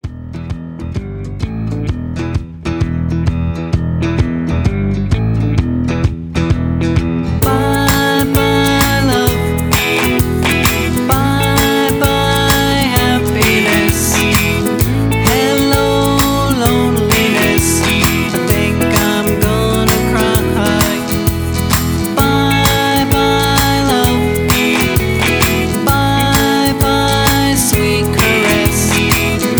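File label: with melody with alt background vocals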